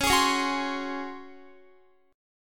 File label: Db Major 7th Suspended 4th, Guitar; strummed